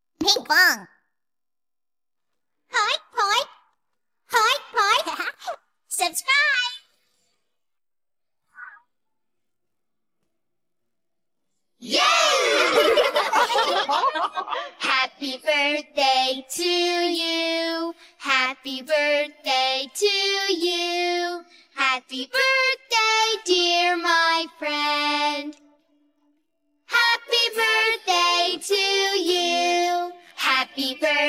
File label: happy birthday song version, vocals